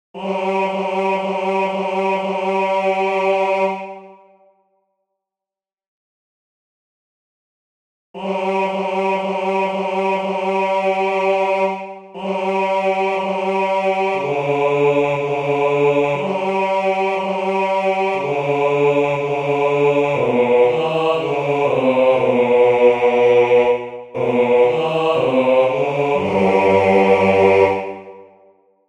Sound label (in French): hommes; NoËl Polonais